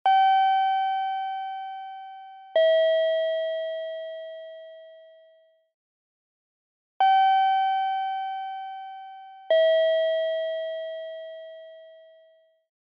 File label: Ticket Gate Signal, Loop